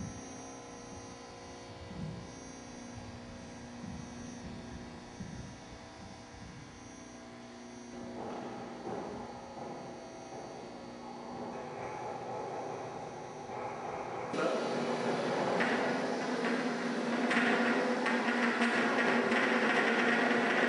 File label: coffee scratching